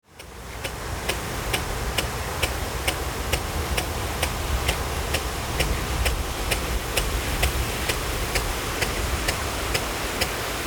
arrosages, Arroser le maïs, Le maïs a soif l’été.; Beynac

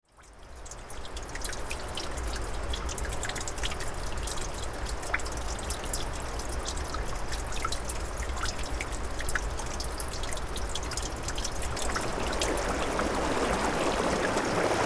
eau saut du chalard

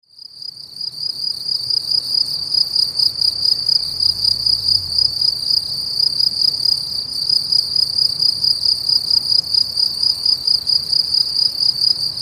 pré avril, A l’heure de la disparition des insectes, certains s’en donnent encore à coeur joie.; criquet; Marnac; Pré d’avril

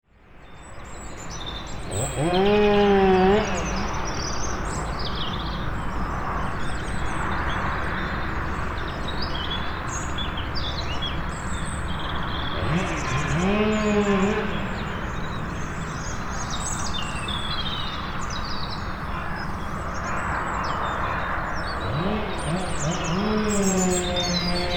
Escaleyrou, Depuis le point de vue, circulation, tronçonneuse, fond d’air.; Point d’écoute : l’Escaleyrou; Aubas

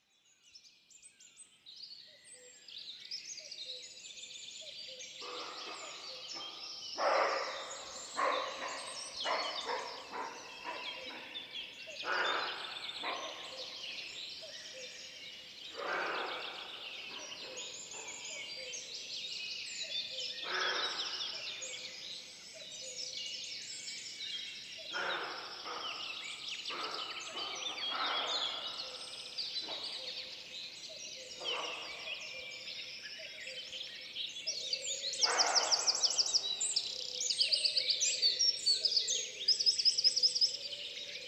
6.45am Bois de Toulx Chevreuils Oiseaux; Série Musiques de création en Nouvelle-Aquitaine

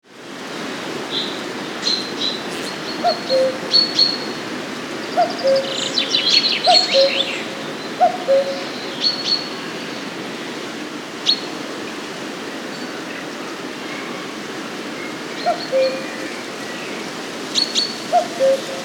coucou, Du vent, un pinson et le coucou de l’année 2018, à la parabole; Vitrac

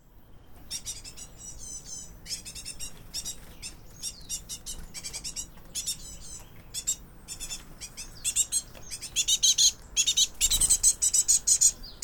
repas mésanges; les instantanes sonores

